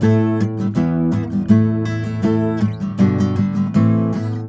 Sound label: AM GTR, Celtic Flavours